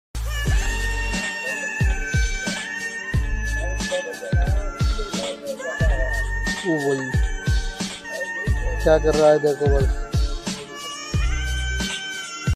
horse animals wolf wildlife motivation music ringtone instrumental